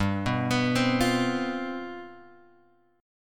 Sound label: G 11th G, chord; G11 Chord {3 2 X 4 1 1} arpeggiated